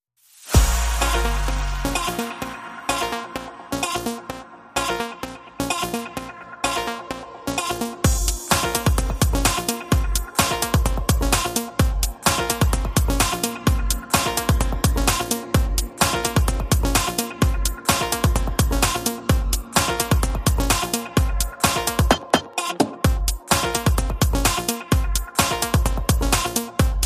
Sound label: No Alarm SFX Mix